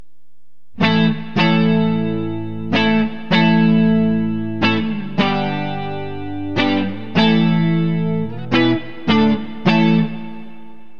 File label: sdcsgold neck clean, burny fugly clips